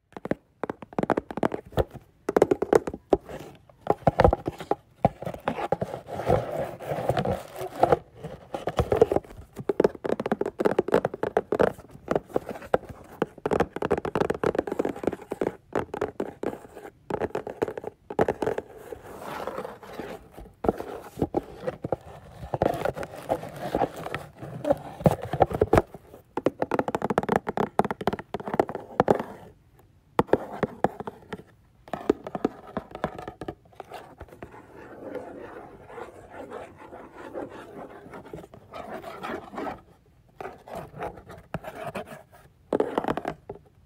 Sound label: Gift Box